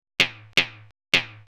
SNARE, Ambient, Drum

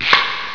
hit Dodec

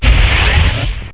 FLARE; The Ultimate Guide to Mortal Kombat

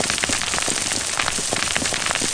skid grav; PC Gamer 49

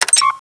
BUTTON; ssteel

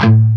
chug

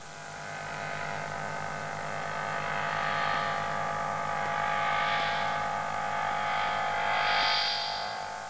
LASER CAN; 10,000 Graphics Pack Volume 1; Media Graphics International

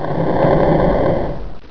Slider Scrape; Mythos

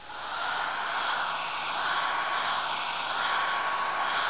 dust bust; Image Bonanza; Expert Software; househld

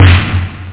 snare; da capo vol1; drums

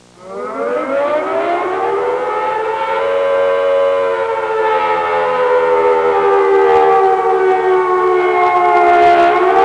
air raid siren; Transportation; System7

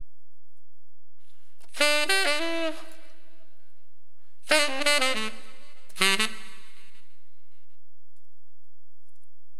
TENOR, Wind; LiveAct Pool; AcidJazz Funk

